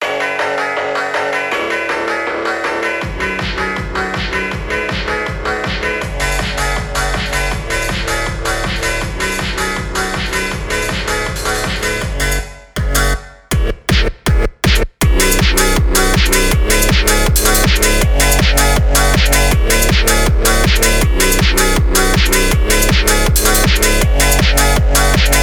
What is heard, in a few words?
phonk